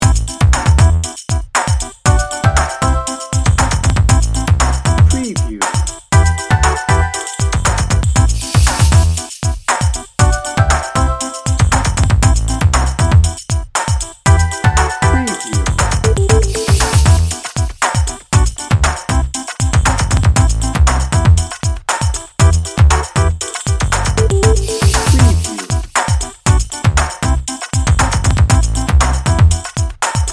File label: hip and groovy